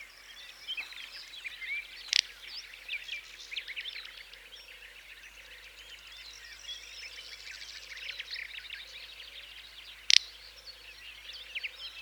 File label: Little-bustard, پرنده زنگوله بال; صدای پرنده زنگوله بال